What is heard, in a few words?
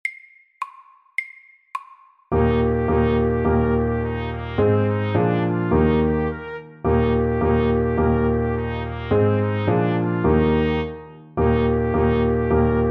Ecossaise, 106